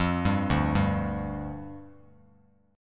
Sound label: D-Minor Double Flat 5th, D, Bass Standard; 13,10,0,0; Dmbb5 arpeggiated